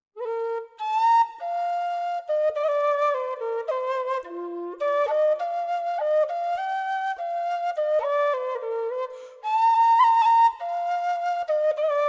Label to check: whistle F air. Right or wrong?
Right